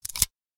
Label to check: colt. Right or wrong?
Right